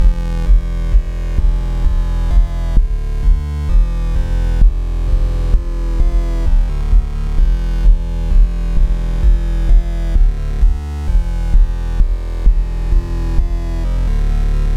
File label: heavy electronic old; The Starfy Archives